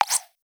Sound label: Jump